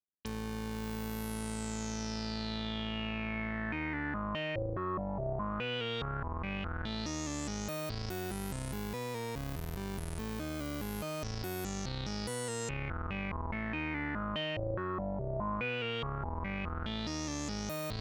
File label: synth triangle